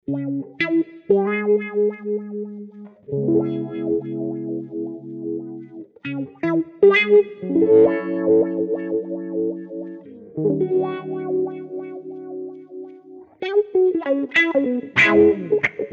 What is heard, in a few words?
wah wah